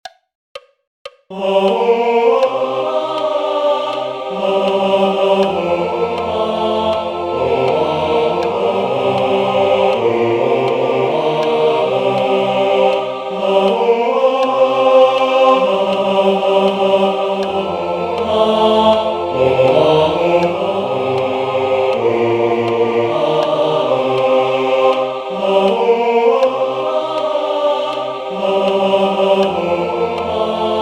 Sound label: Tutti; LA CHORALE ANARCHISTE